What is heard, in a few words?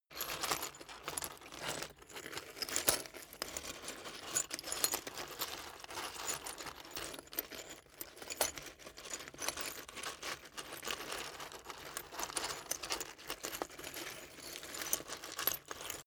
glass debris scrunch sequence; objekte